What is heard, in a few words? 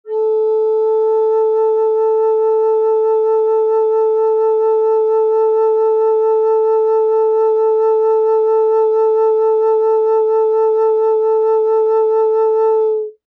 Flutes Single Note, Medium A